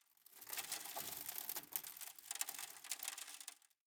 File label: Sand Auto